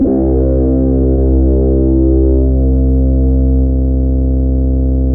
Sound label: Fuzz Gtr C2, Fairlight CMI IIe